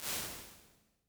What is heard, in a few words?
Big Bottom, Lexicon 480L Reverb, impulse responses; Big Gee's